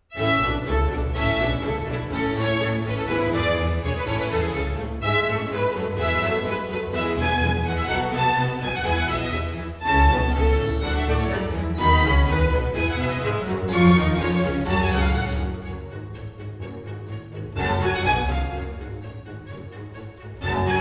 Concerto in B flat major for strings and harpsichord Allegro; RV 163; A. Vivaldi; Concertos and Sinfonias; 尼古拉斯 · 麦克吉根